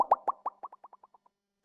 bubble

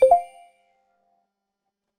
pop

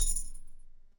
arabic shimmer

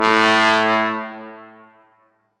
viking horn

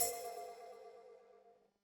chimes

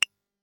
wooden

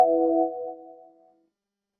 synth pluck

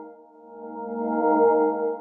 synth swoop

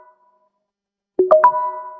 slow ding dong

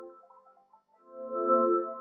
synth swoop

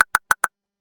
iphone keyboard

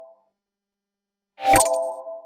reveal

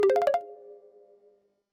buzzer

